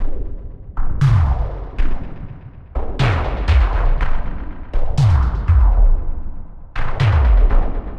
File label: 120 Beats, Drum Loops; Blissed Beats; Touch Loops; BlissedOutBeats